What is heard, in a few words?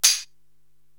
Dirty Sleighbell; Korg TR-Rack